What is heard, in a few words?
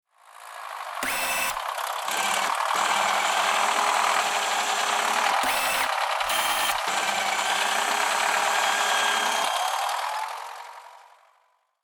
RC excavator toy digging; rc toys